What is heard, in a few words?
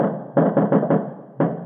church; ambient; inferno